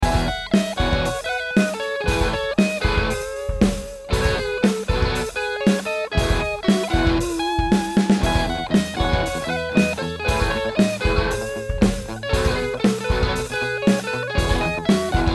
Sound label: Crunch 1x10 Combo